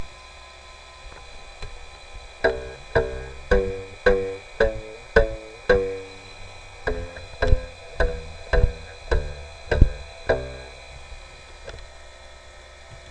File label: guitar